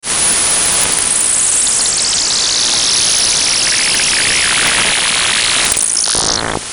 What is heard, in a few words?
squiggle